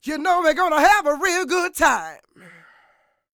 SING, Vocal Planet Blues Soul BLUESY

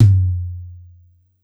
G2 Jazz Tom 2, Yamaha PSR-E323 Symphony Kit

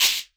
Elektron Monomachine, Drum Machines

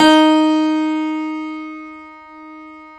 Classical Piano ff D2; PS-53 Total Piano; Brilliand Classical Piano

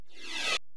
Ride, Alesis DM-PRO

